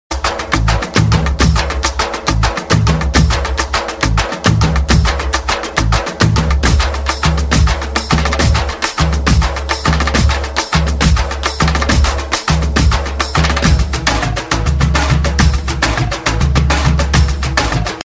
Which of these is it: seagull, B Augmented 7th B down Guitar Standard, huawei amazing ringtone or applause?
huawei amazing ringtone